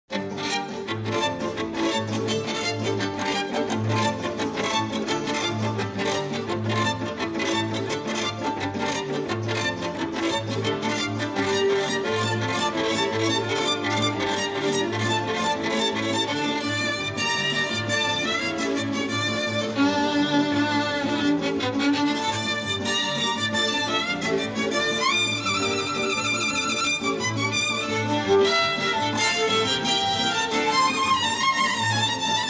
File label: TWO GUITARS